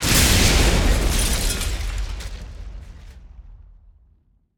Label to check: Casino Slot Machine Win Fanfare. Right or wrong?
Wrong